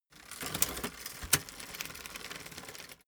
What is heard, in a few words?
bicycle street upshift